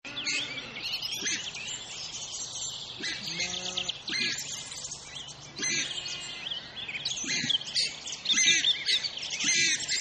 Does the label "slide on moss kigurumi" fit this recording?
No